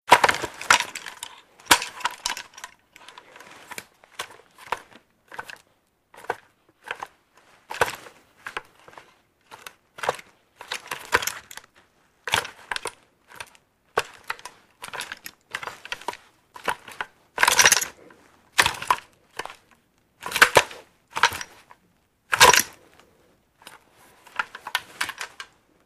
Gun Handling Light, handgun mvmt

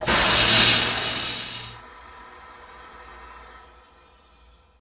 Airlock Door Open, pneumatic

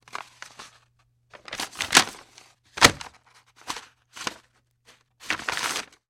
Parchment Paper Hand

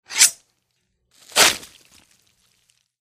Tomahawk Scalping

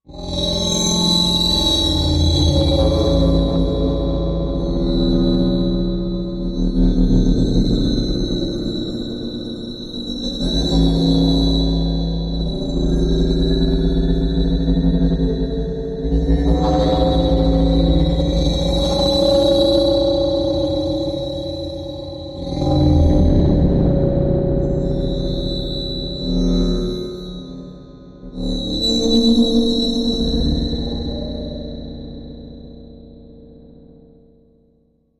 Lost Plasma Electro Sta, eerie ambience

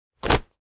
Impact Hard, rocks & dirt

